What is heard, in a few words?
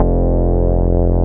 BAS MINI, MOOG; Sound & Vision - Gigapack II CD 1